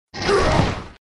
Monster dead

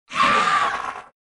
Boss Dead

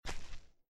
empty

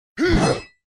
Boss Attack

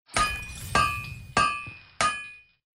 succeed

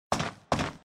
male run

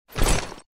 dress Weapon

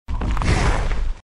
Boss Attack

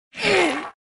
Boss Dead

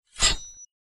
Doff Weapon

